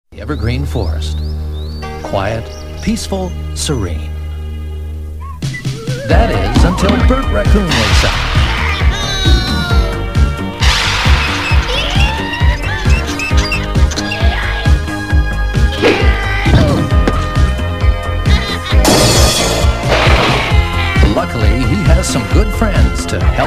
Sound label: instrumental theme; raccoons series